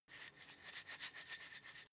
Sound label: herbs shake light; berufe; gastronomie; soundpool